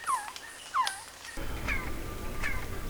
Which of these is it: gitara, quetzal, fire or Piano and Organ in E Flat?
quetzal